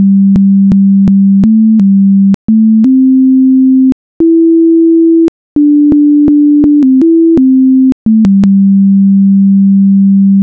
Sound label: note Play